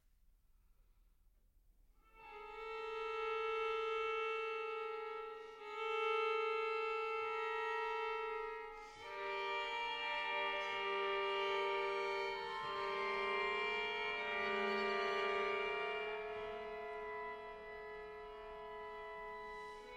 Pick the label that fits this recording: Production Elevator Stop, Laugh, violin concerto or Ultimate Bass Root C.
violin concerto